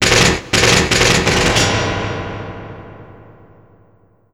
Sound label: UZI, TOWN FX; USB Soundscan vol.02; Underground Hip Hop; AKAI